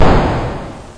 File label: fire, video games; tankbatt